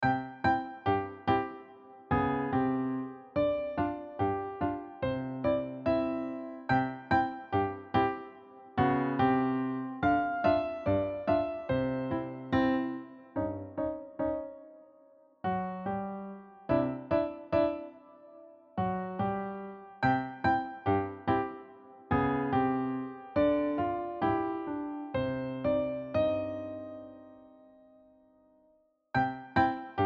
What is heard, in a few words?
Knock Knock DUET, piano; 8 fun solos for elementary inspired by jokes